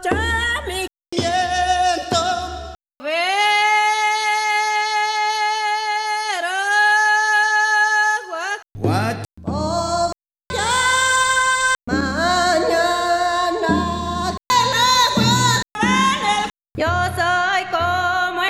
portamento ascendente

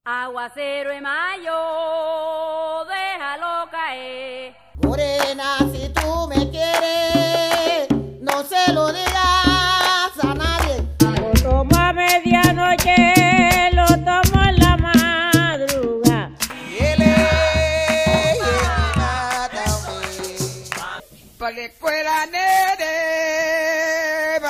vibrato

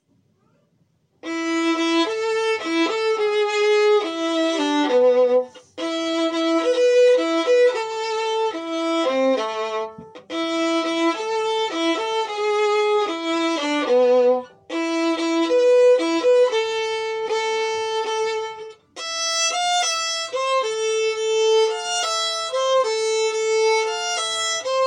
cumbia lento

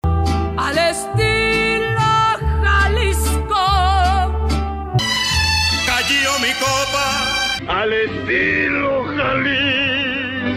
Gruñido

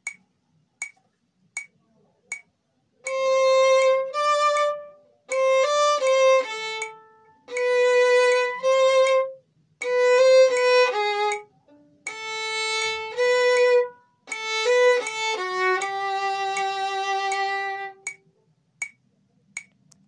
Extracto B lento con metronomo

